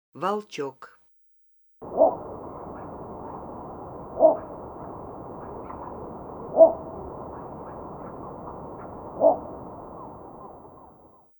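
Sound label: little bittern voice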